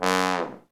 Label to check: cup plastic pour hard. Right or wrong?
Wrong